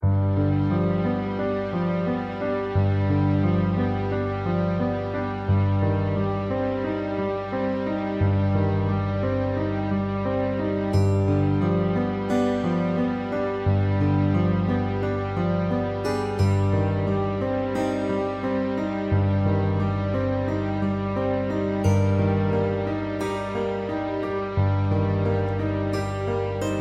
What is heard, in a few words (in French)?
Ave Maria quatuor